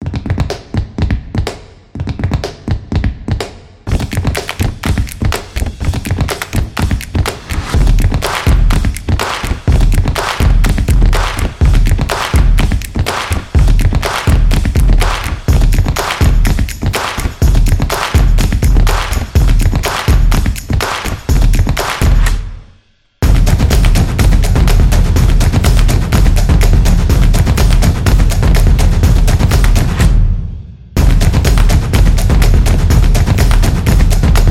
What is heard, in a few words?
Beat Drum